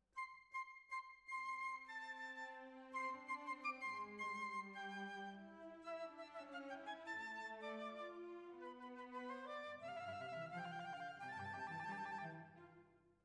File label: Oboe